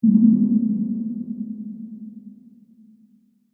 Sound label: Bell Muted Reverb, Production, Element, Bell, Muted, Reverb; Bells (Sound Effects:Musical); BlastWave